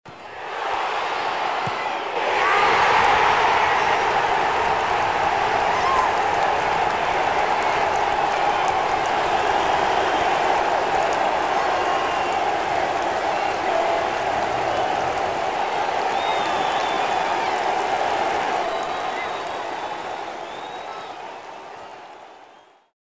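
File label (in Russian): забивают гол на футболе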